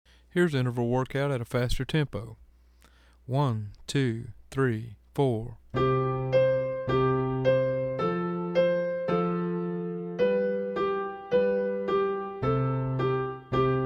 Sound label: Interval Workout Fast